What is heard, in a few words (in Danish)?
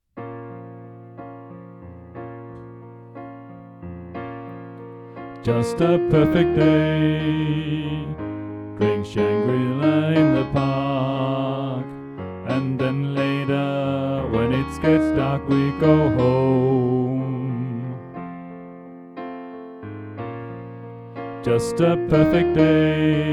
Sopran; oevefiler